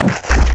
mutant

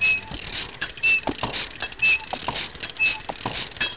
loopy motor

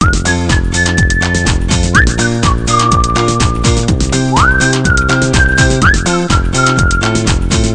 music loop; The Stock Market Royalty Free Images

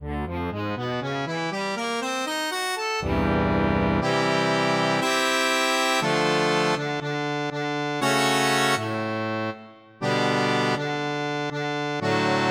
Bandneon; EverQuest

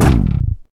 kick

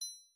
bricks; nextstep; BoinkOut

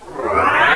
zoom in; duke nukem total meltdown

